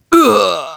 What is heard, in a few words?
enemy die; Wild West Shootout (Arcade) (gamerip) (2019)